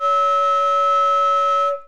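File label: C sharp 5 with E5, flute multiphonics; modern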